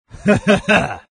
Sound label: laugh, zh; Invo; Dota 2